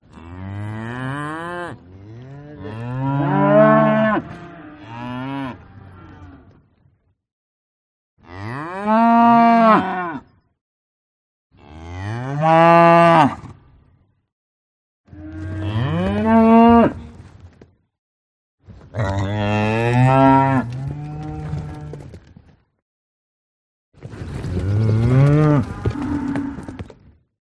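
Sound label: cows, cattle, moo, mooing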